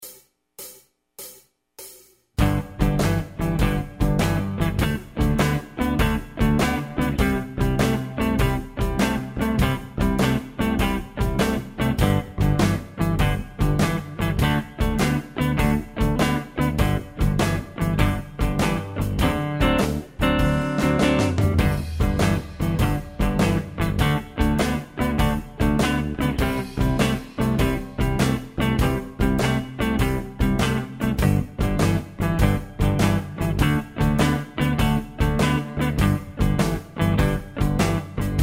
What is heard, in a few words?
8 bar full, blues guitar